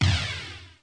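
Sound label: snare drum flange, Kawai R50 drum machine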